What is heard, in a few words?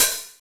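HH, TR-909 hi-hat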